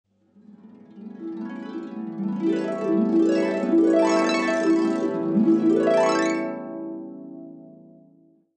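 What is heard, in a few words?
harp glissando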